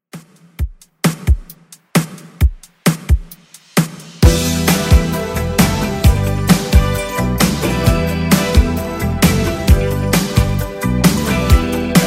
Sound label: 80s Redrum, 132 bpm